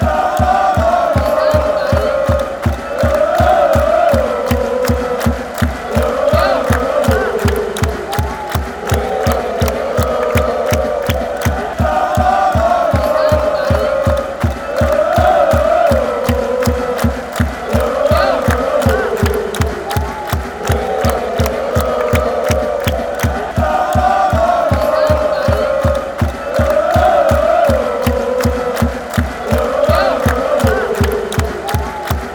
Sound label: la la la